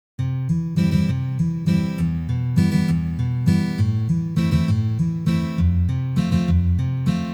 Valsovyj boj na gitare

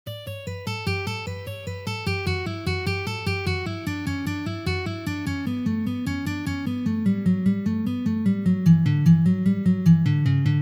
Uprazhnenie dlya igry gammy Si minor

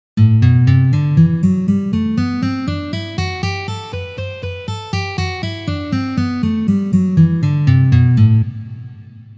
Gamma Mi minor naturalnyj vid pozitsiya

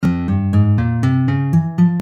Garmonicheskij Fa minor

